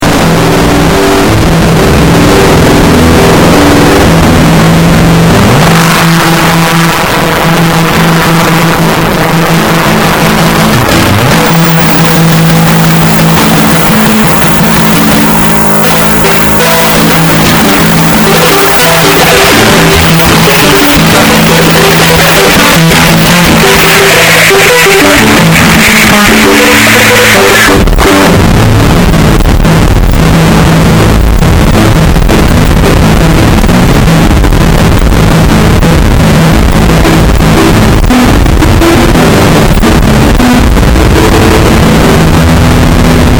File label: delay breakdown